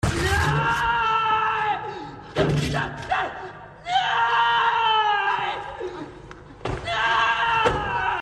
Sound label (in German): creep schrei